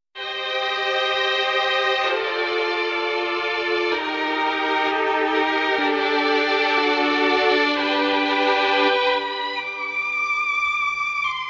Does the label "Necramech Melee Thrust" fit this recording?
No